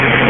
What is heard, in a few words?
sony recorder noise raw; voice